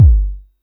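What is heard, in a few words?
vermona drm1 mk3 bass drum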